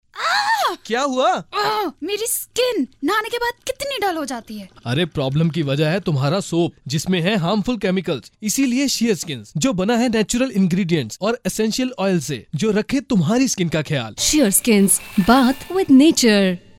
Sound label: Soap arhhhh; FM Sheer Skinz Soap